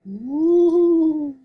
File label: ghost ooo; jibo-anim-db-animations